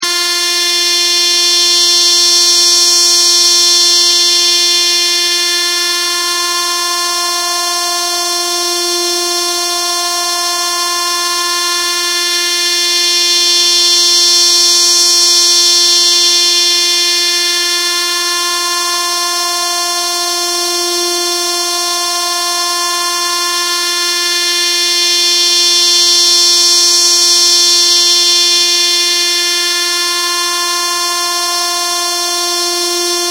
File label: tone